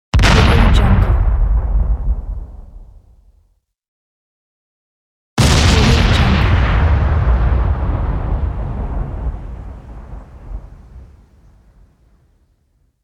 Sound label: explosions